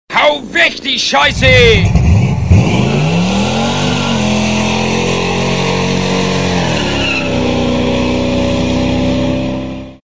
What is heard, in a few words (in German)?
Jingels